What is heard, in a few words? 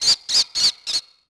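ambience bird; Tony Hawk's Project 8; XBOX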